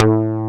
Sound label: DIGITAL BASS, BassGuitar